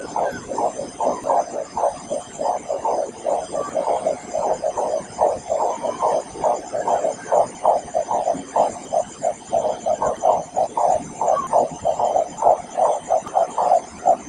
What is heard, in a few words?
Large-tailed Nightjar calls; Ipoh City Perak Malaysia